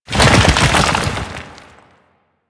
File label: Fusion Pillar Fall; Warframe; Warframes; Qorvex